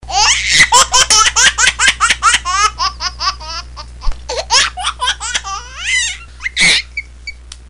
Baby laughing

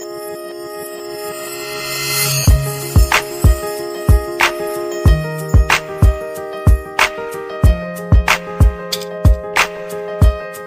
Dreamy

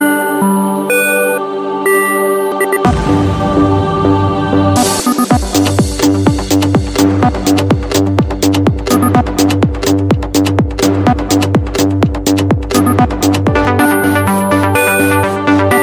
Monophonic

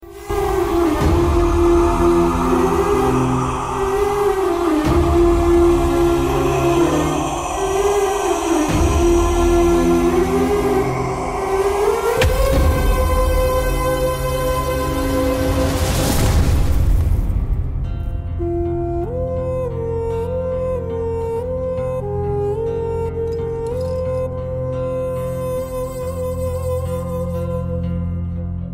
sad theme; Ekkadiki

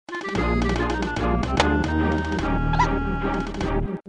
Game Over; Donkeykong

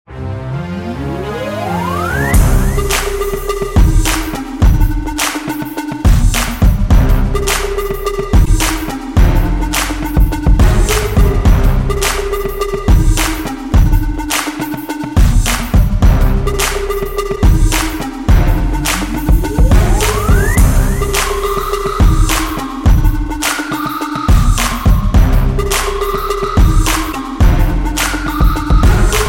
Bass Drop

